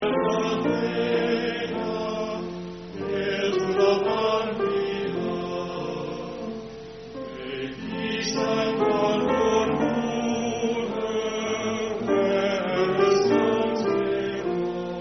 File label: Hail, Hail Phi Delta Theta